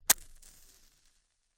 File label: right, binaural recording; theverge